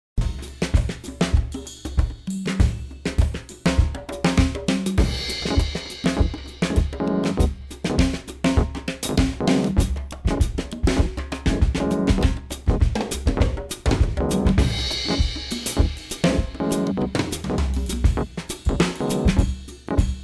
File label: Parallel Distortion Drums Piano Unprocessed